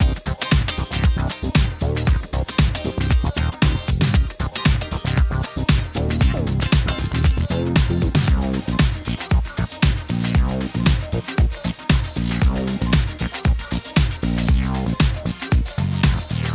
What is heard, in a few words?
ReSpin Music Loop; playboy Gold Desktop; Mahi Gaming